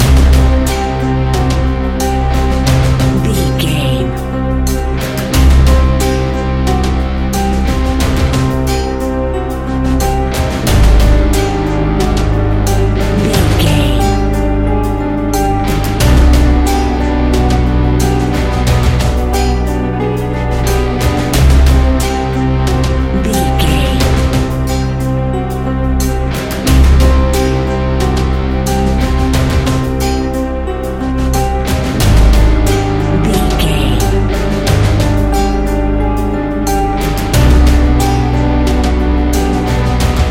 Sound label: modern horror